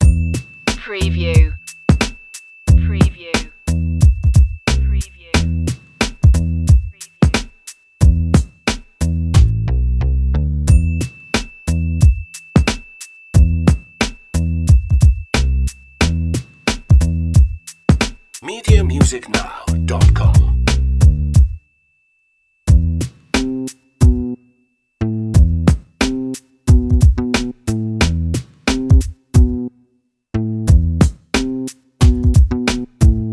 A cool dramedy hip-hop track with pizzicato strings; quirky